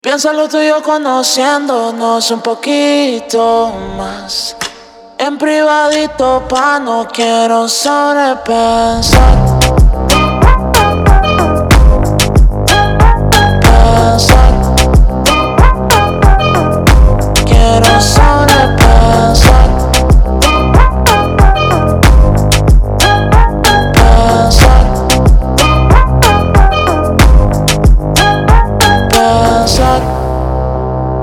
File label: Phonk MONTAGEM Slowed